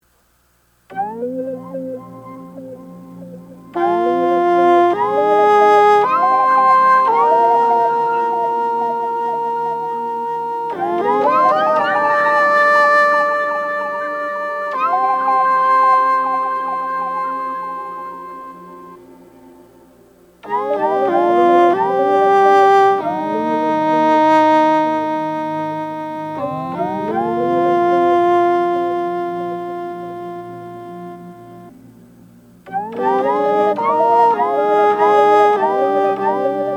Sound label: English Horn Prayer (+Omnisphere); Randy Felts